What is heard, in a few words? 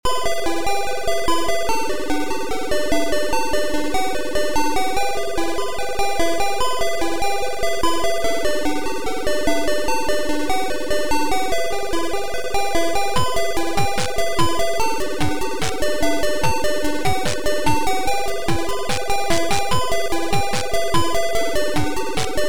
Outside Tune